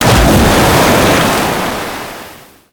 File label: zodiac splash big; veh; Call of Duty Modern Warfare